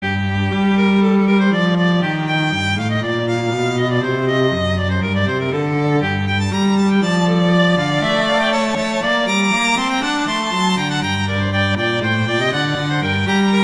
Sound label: Allegro; Corelli